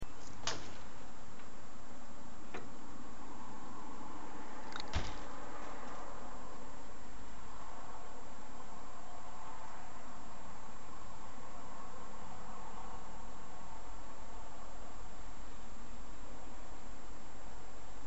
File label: ambient noise